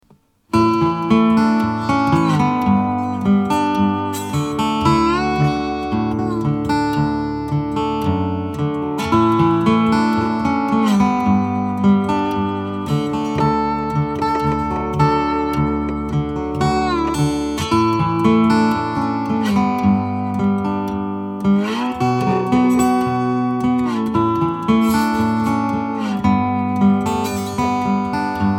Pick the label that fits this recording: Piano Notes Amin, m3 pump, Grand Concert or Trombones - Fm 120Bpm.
Grand Concert